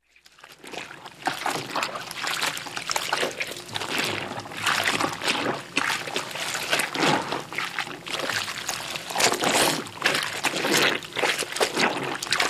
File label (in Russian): zvuk ruchnoj stirki